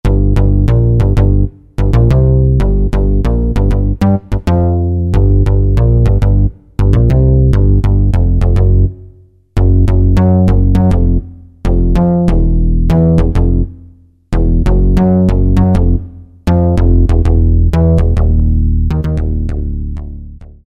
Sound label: Zap Bass, Korg 05RW